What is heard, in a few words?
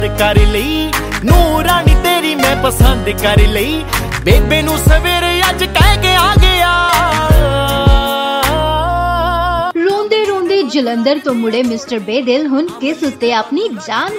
punjabi ringtone